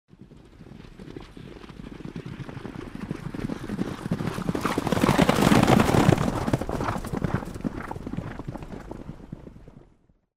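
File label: horse galloping on ground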